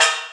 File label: raw snare d sharp key, steel snare drum sound tuned to the D# note, Loudest frequency: 3329Hz; snare drum samples; one shots